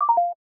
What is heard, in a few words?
section fail; Obsidian